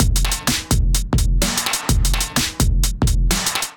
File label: Kit 127 bpm, drums; MIDI Clips; Fabrik Kit; Ableton; Factory Packs; Skitter and Step